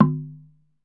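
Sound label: Tongue Drums, low; E-MU Producer Series Vol. 7 – Old World Instruments; Pitched Percussn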